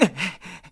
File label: Ledge Grab; Link falling and grabbing a ledge